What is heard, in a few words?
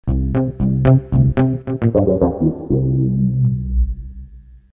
pick